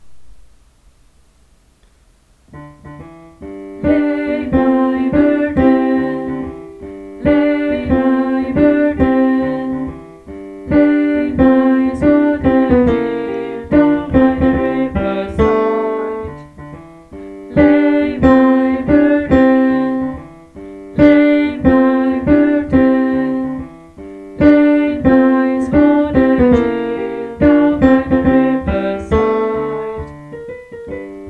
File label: down tenor